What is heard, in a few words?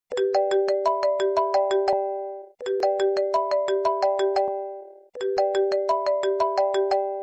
phone call